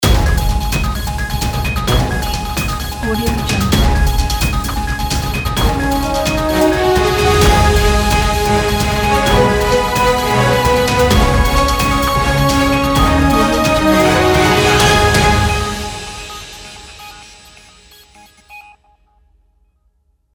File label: news intro ident